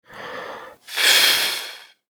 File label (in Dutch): kaars uitblazen